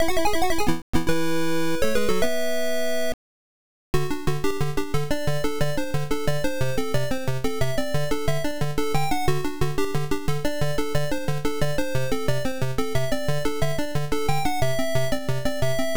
Power-Up BGM, MSX; TwinBee

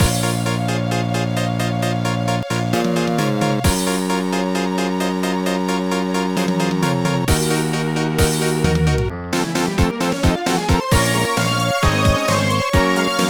Pop Stage; pinky street kira kira music night pop town gamerip

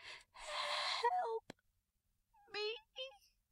take rose

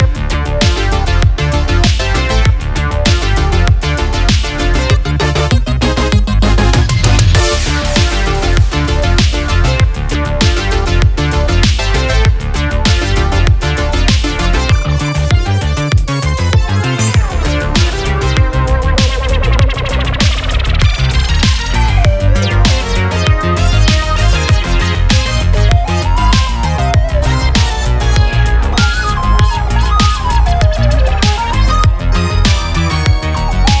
Slow Loop; Galaxy of Pen & Paper

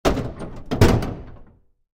Door; Five Nights at Freddy's Final Hours 2

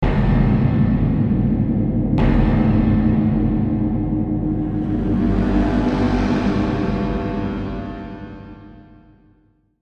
Tension; Darksiders

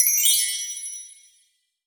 chimes magical bells; My Cute Commissar

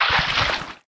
Slimethrower Start

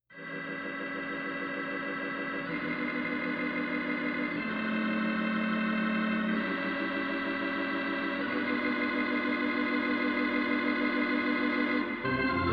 ORGAN MUSIC CHARGE; Spider-Man 2 2004 ps2 gamerip

